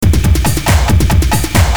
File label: Loop